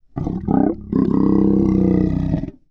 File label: Throaty & Deep, Vocals - Monster; Sony Pictures Sound Effects Series